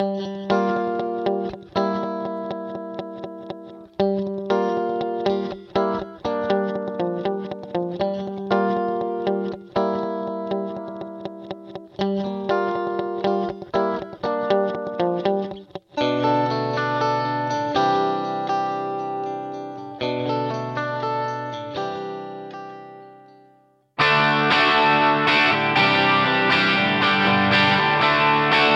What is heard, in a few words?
Guitar Yamaha Rev7 ER1 Mode 4; Vintage Digital